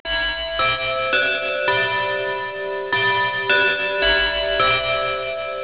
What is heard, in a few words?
clock toll